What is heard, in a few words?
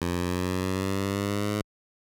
Bubble Appears